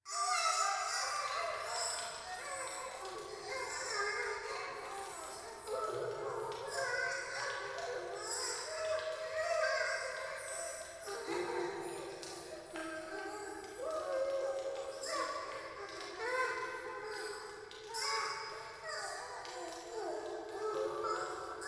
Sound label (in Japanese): 風呂 シャブ喘ぎ 暖炉, ASMR